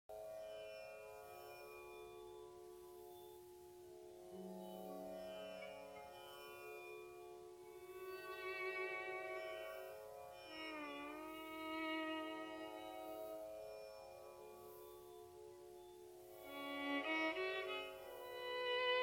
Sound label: Instrumental